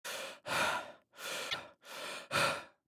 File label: Body Noises; Blastwave FX